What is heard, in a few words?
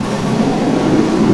Snare Drum; Percussion Samples